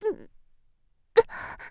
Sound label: die; Eternal Return; Tia; Voice Line; KOR